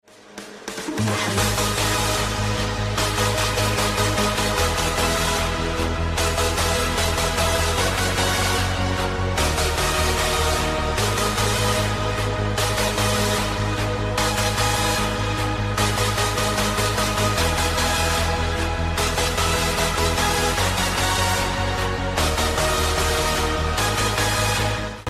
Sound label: Hardstyle